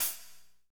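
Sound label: hi-hat, Pop Country, Dry; Northstar; Drumscapes Roland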